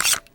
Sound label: sweat; buckler